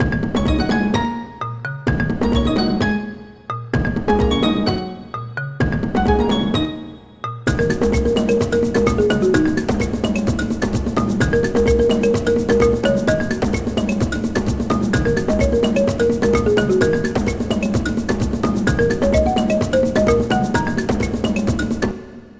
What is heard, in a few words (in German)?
MUSIK; MOBICLIC 77